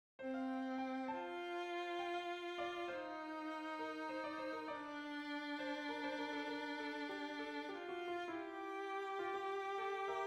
Waltz Melody Harmony

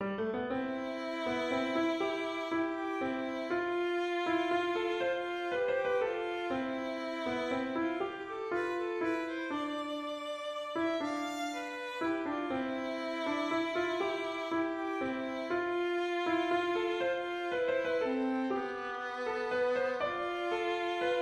waltz; Black Velvet

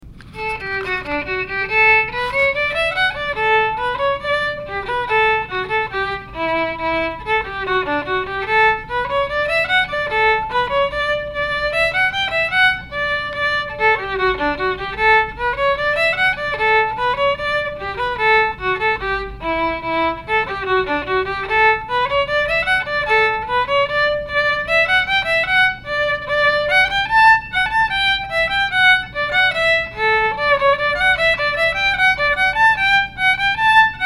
hornpipe; staten island

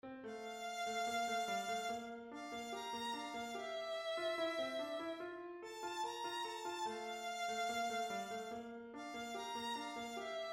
Melody Harmony

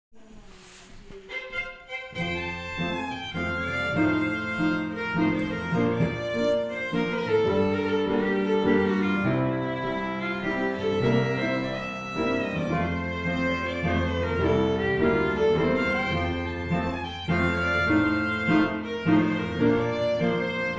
waltz

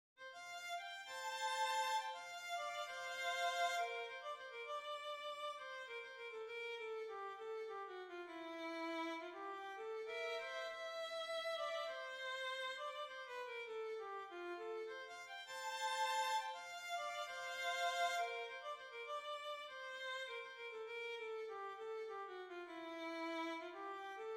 Waltz Melody

